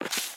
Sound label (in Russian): Положить карту